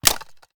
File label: reload empty magout, weapons; gmod; viper